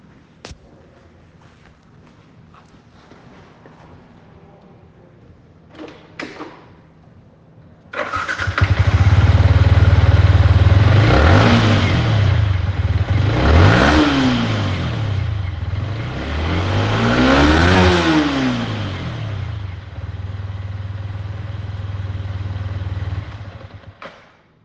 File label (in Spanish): YAMAHA MT 125 SONIDO